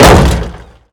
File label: Generic Thud, impacts; Totaled; XBOX